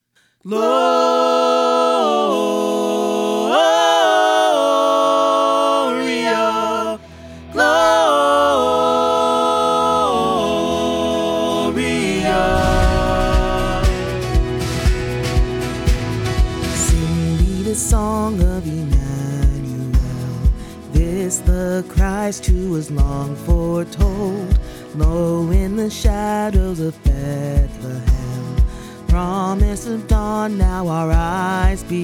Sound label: Full Choir; 2025 christmas choir